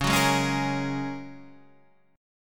A sharp Minor 7th Sharp 5th C sharp

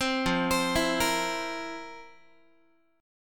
F Major 7th Suspended 4th, Guitar; arpeggiated

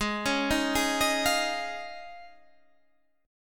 Eb 7th Suspended 2nd Suspended 4th Ab

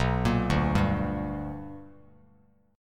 B Minor Major 7th Sharp 5th Bass Standard; arpeggiated; JGuitar

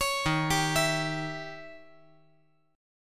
D sharp 7th Flat 9th; arpeggiated; JGuitar

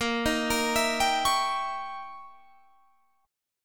D sharp 7th Flat 9th, A sharp

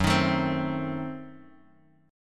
F sharp Diminished 7th F sharp Bass Standard; strummed; JGuitar

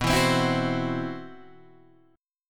C Major 7th Flat 5th B

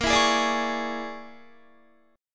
Bb 7th Sharp 9th Flat 5th Bb, Guitar; Bb7#9b5; strummed; JGuitar

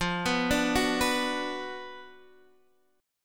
D 6th F, chord; D6/F arpeggiated; JGuitar

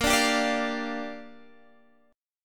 Bb 6th, Guitar, Standard; 18,17,17,19,18,x; Bb6 strummed; JGuitar

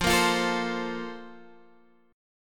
Bb Major 7th Suspended 2nd

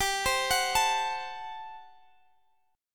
A-Minor 6th G, chord; Am6/G; arpeggiated

